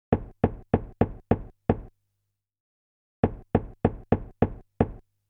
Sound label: Move 12 Spaces, string quartet